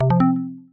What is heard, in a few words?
notification; scruff